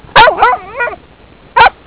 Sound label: barks